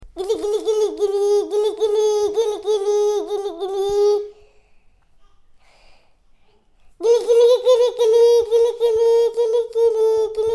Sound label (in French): chatouilles